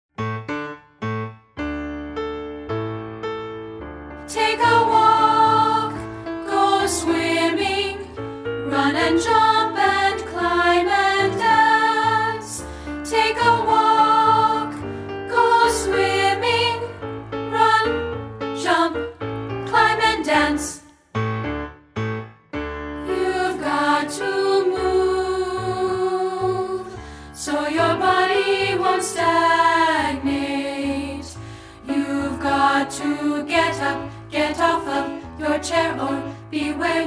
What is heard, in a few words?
Exercise Tango